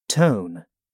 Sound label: tone